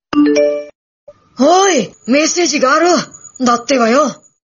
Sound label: Ringtone Anime WA; Naruto